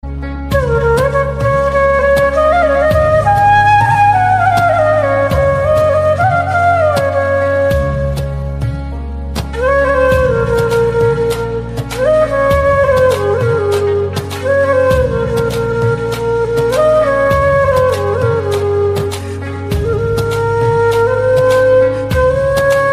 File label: Wedding Flute Ringtone